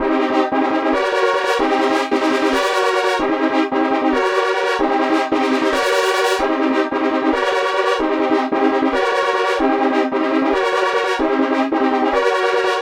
filter horns, 150; Masterbits - Tekkno Trax; SQ LOOP 150

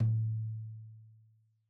Tom2 Stereo, Deeply Sampled Drums

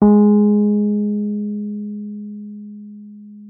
WURLITZER G#3; Xsample VOL-1; 90 s Sample CDs; WURLITZER B

